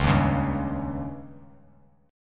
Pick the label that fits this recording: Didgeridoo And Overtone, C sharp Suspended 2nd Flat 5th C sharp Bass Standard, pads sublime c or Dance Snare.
C sharp Suspended 2nd Flat 5th C sharp Bass Standard